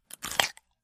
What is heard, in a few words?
Bite Carrot Juicy